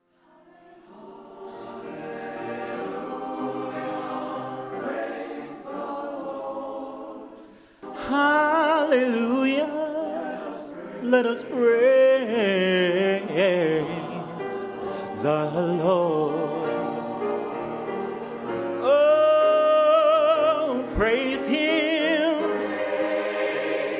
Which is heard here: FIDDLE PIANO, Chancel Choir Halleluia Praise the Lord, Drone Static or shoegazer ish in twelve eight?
Chancel Choir Halleluia Praise the Lord